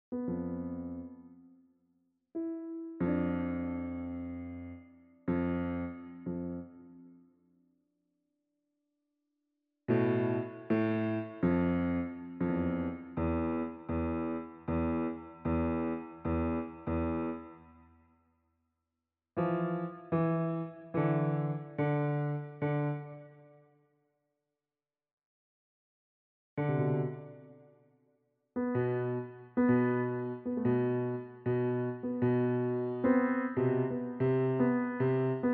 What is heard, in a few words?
Piano